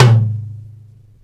tom low; osu

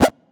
drum hitwhistle; osu

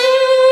violin; osu

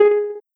soft hit whistle

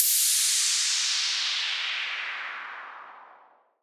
crash; osu

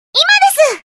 koto

